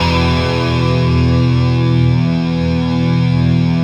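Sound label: hazard chord, guitar; Spectrasonics; Bizarre Guitar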